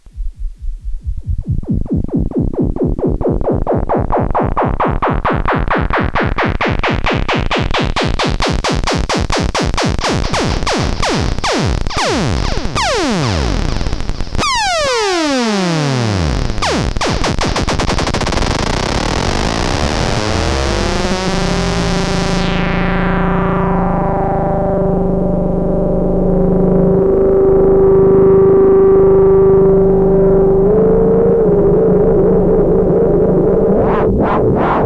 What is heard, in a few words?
Korg Monotron Delay